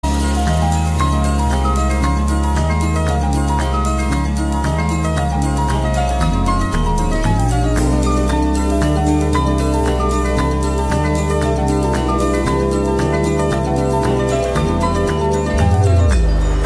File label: Galaxy